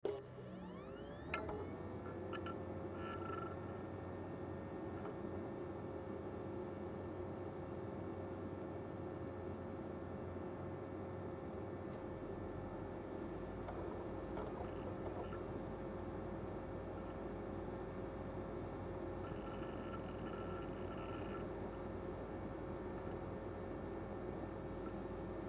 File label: Video Game Console Turn On Power Up; Nintendo Wii; Technology; Video Games; Blastwave